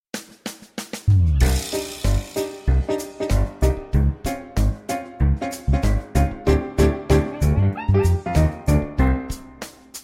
Jungle Disco

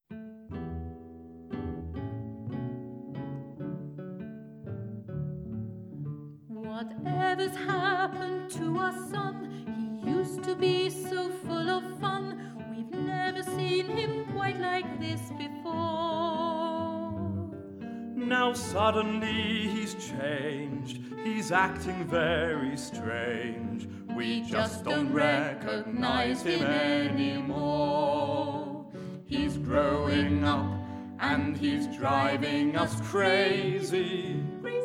Teenager Blues Verse 2

